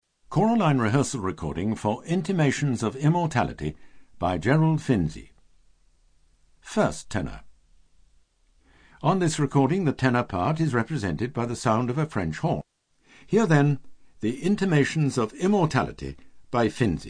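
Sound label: Intimations of Immortality Tenor; Finzi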